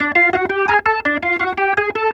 Organ Lick; Ambient Grooves; Organs Licks

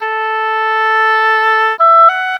Oboe, Orchestral, Rock Pop

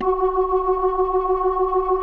B3, First Call Vintage Keyboards

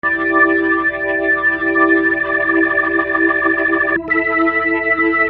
Keyboard, Soul; Sonic Foundry (Sony Creative Software); Infinite Soul VOL-1

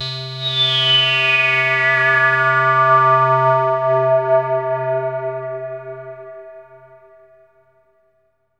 Long Reso Sweep; Trance Formation

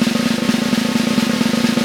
Vintage Snare Drum; Q Up Arts; Johnny.C's Vintage Snare Drum; 5.5X14 SLR

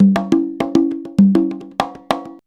CONGA, Modern Percussion Loops

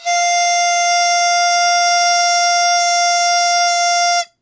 World Winds, Old World Instruments

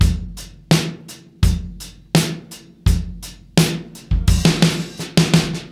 Beat Loops, 084; Zero-G; Monster Beats

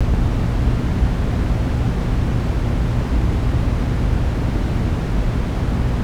room tone; E-MU Producer Series Vol. 3 – Hollywood Sound Effects; Ambient Sounds; Room Tones

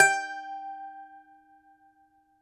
DOMRA, Russian Strings

